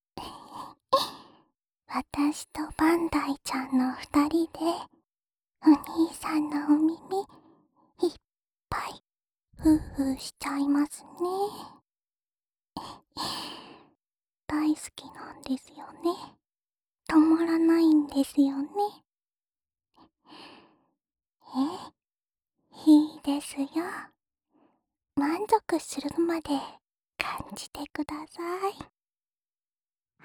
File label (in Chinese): 耳朵呼呼吹气 位置反转, ASMR